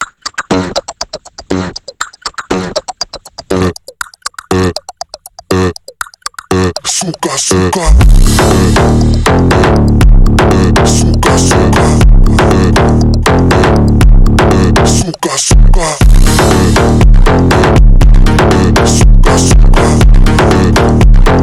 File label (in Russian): Brazilskij fonk dlya trenirovok